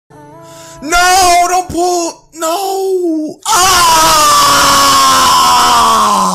crying; flightreacts

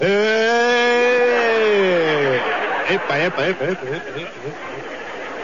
eeeeeei

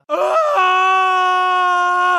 fall scream; mk9; kenshi

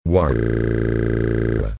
dad crying

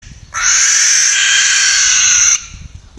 barn owl screech

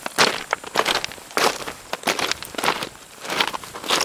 gravel

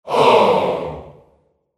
audience disappointed ooh aww

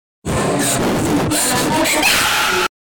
sniff sniff paaahhh

